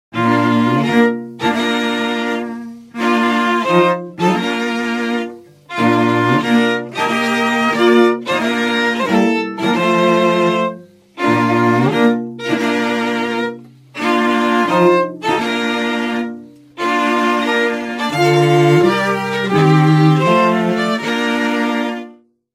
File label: strings wedding march